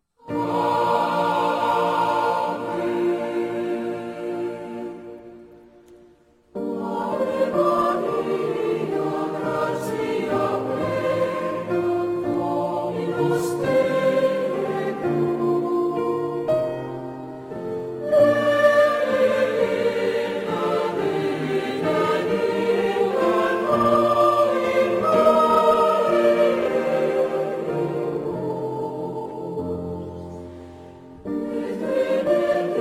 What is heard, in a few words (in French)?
choeur; elgar ave maria